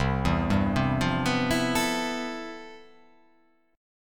C Major 13th C

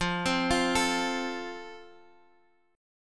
F Major, Guitar Half Step Down

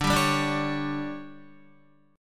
D Major 7th Suspended 4th, D, down, Guitar; strummed

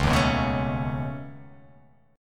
C sharp 7th Suspended 2nd Sharp 5th C sharp down

